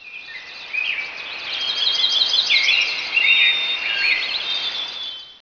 Dawn

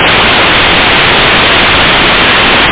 synth

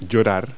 cry; verb

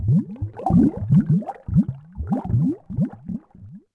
bubbles; DAoC

